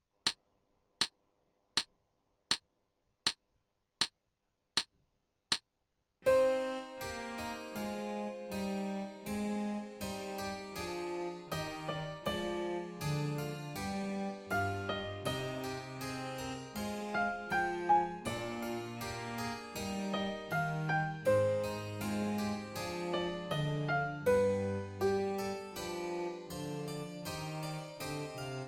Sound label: Adagio - Full Tempo With Solo, Oboe; Handel - Sonata No. 1